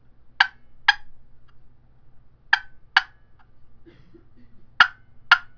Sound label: poplar cherry box call clucks; Southland Sounds